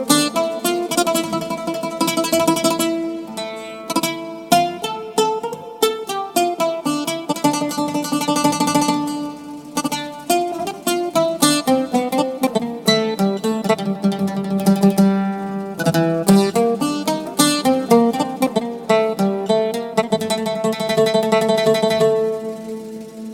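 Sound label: guitar music ringtone, hindi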